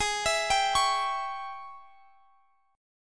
Ab Major 7th Suspended 4th Sharp 5th Ab down Guitar Drop D